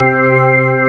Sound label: ORGAN C1; VOCAL ORGAN; ORGAN #4